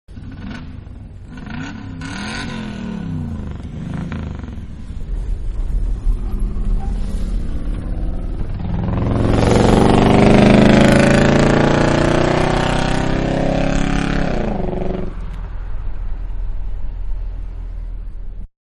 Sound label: Souped Up Car, Driving; Car Supped Up-Driving; Vehicles; Manhattan Production Music